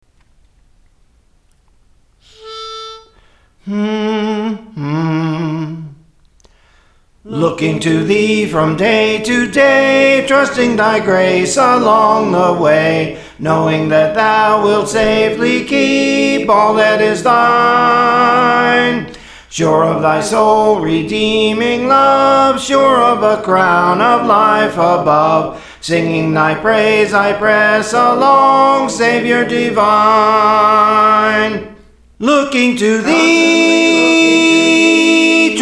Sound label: Soprano, Songs; Praise For The Lord Training; Looking to Thee